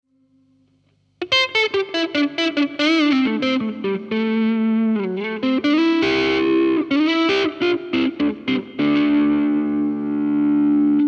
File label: drive